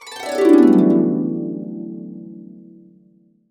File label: D MAJ, Harps; USB Soundscan vol.62; AKAI